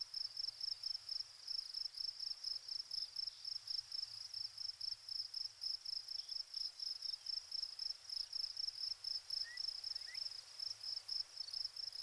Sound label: wild west ambience; time travel